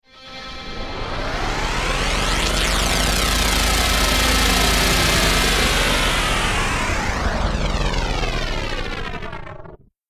Generator Power Up And Down Quickly Stalled Mechanical Device; Tech (Sound Effects:Science Fiction)